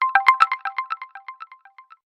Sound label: Lumia Alert; Lumia 1020